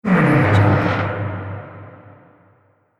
haunted house door creak

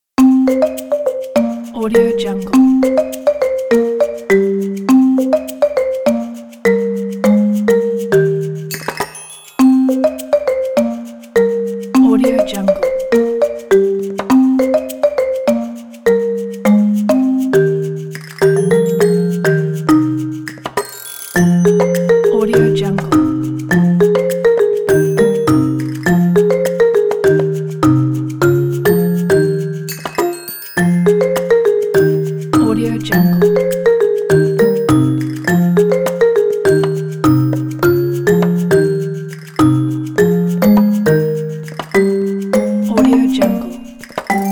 kids marimba background; noiseworld

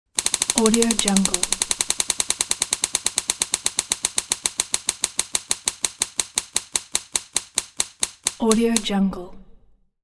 fortune prize wheel; alexm76

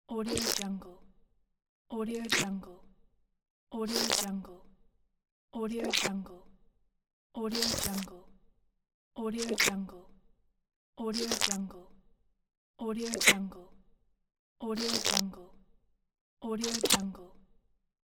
slow steps in viscous mud; creatorium-sound-design

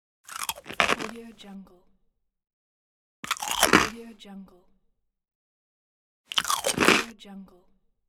bite rusks

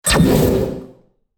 power up

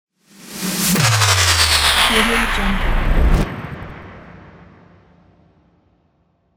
retro sci fi

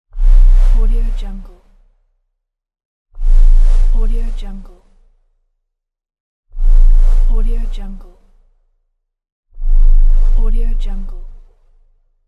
wind whoosh

